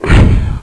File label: shoot